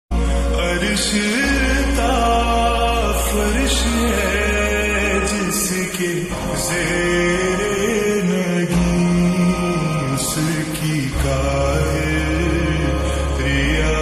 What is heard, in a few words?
slowed reverb ringtone